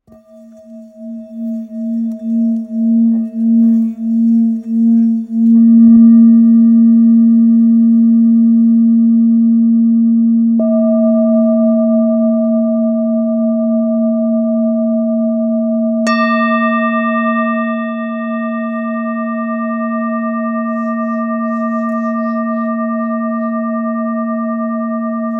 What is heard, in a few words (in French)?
Bol ancien la